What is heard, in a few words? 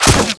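crossbow fire; z-lapik ru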